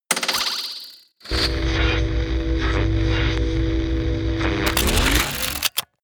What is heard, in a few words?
promo pad